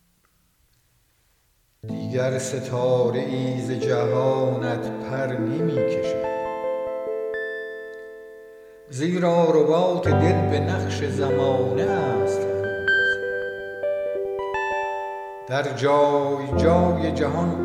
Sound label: piano